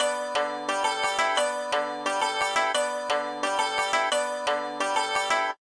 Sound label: koto sounds; WORLD